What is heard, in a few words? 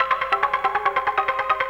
KIT G, DANCE KIT; ProSamples vol.55 - Retro Sampler